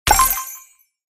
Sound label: Chest Buy Button